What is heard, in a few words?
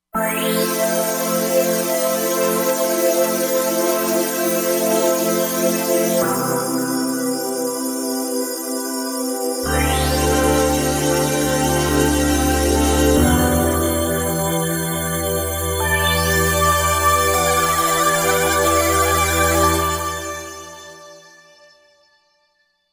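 pad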